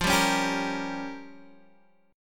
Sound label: G Minor 7th Flat 5th